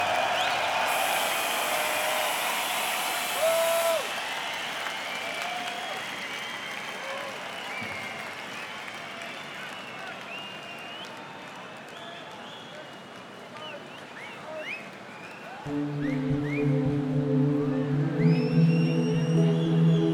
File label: Adagio For Strings